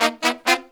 132, Pop & Funk Brass; Best Service ProSamples vol.25; AKAI

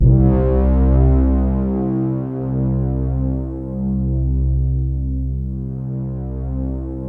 KT76 BRA, synbrass; Synthesizers

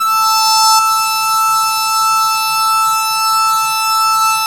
YAMAHA CS80 PWM, Vintage Keyboards; Big Fish Audio - First Call Vintage Keyboards

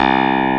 CLAVINET C2; Vintage Keys; E-MU Formula 4000 Series Vol. 3; Analog Odyssey

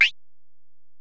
EPerc, Alesis DM-PRO

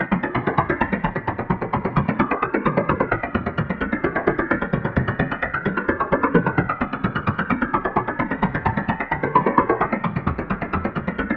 SYNTH LOOPS; Zero G; Malice In Wonderland

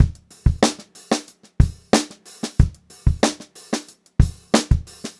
92 ST BEAT, Funk-Groove Drumloops; USB Soundscan vol.38; AKAI

